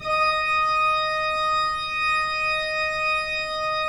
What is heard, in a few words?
PIPE, Organs; E-MU Producer Series Vol. 5 – 3-D Audio Collection; 3D Pipes Dr Joyce